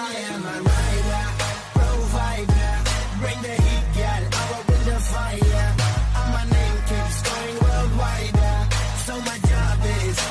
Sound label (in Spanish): nuevo tono de llamada en ingles